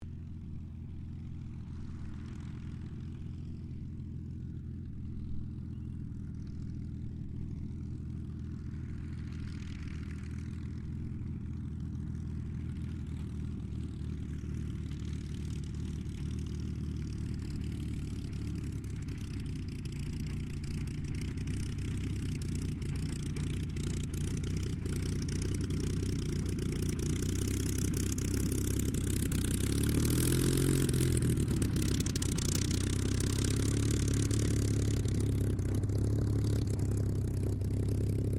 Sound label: AIRCRAFT SUPERMARINE SPITFIRE MK-XVI TAXI DISTANT; Airplanes; Vehicles; Airplane Sound Effects